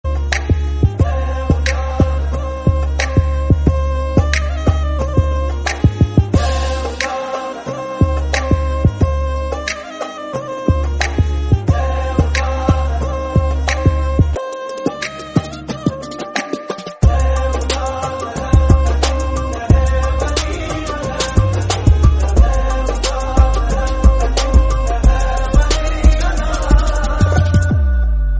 Bgm Ringtone

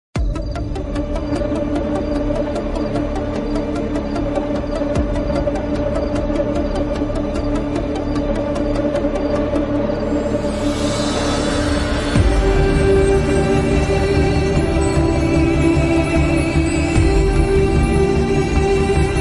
Sad Bgm Ringtone

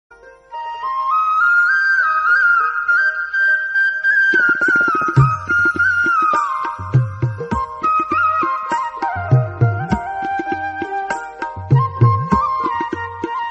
Mind Fresh Flute Ringtone

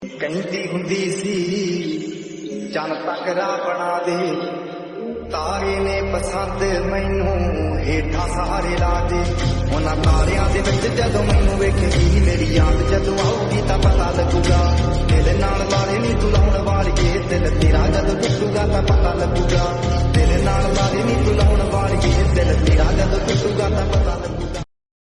Punjabi Song Ringtone

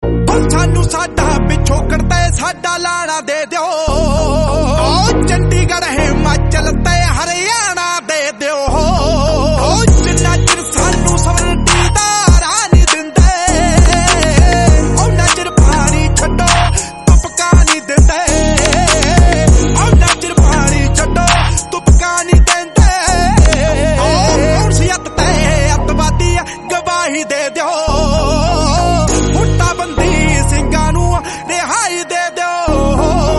Punjabi Song Ringtone

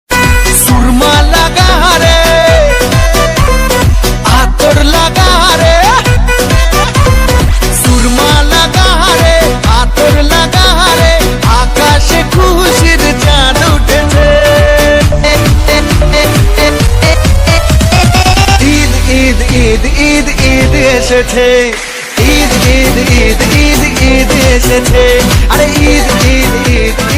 Eid Mubarak Ringtone

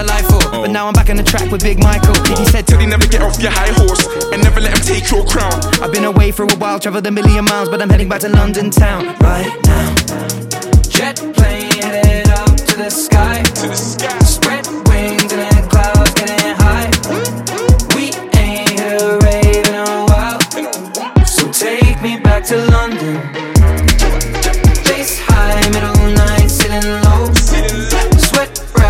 English Ringtone

